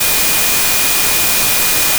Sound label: additive cello single; spectral convergence; FFTimbre